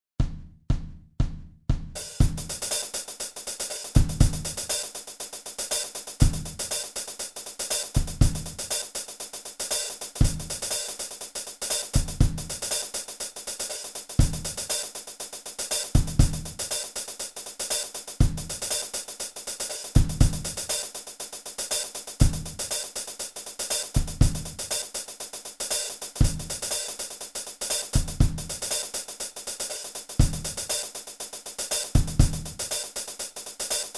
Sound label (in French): Batterie